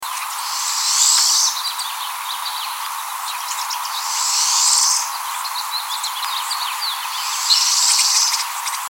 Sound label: Pine Siskin zzzzt, An ascending, unmusical dzzzzzzzd